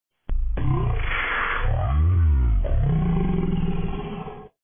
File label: zing